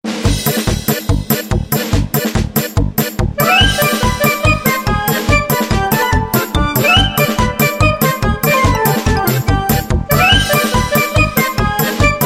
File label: Cute Music for Kids Crazy Chase